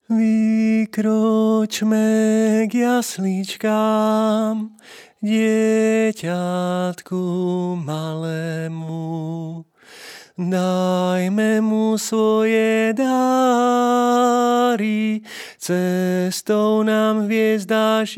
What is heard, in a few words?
tenor; vykrocme k jaslickam